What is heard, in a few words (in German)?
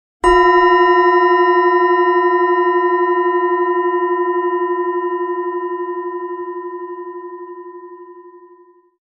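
Planetenschale inkl. Klöppel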